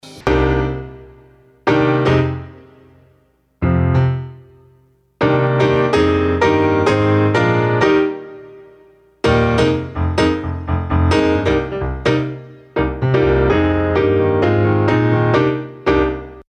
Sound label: Pop Piano; Pulsar 1178